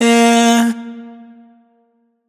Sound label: riff Airy Yeah Chop Gm wet, Vocal; Slate Digital Omnivox Vocal Sample Pack